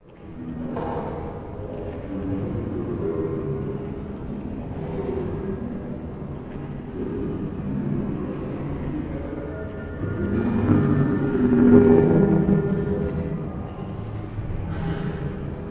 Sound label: Office Ambient; Golden Light